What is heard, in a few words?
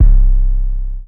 808 Crisp (C); 808s; Cymatics; GHOST (Beta Pack)